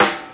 Subby Acoustic Snare G Key, snare tuned to the G note. Loudest frequency: 1932Hz; snare drum samples